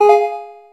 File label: electric etc, keytone; SKY IM-A600S-Sirius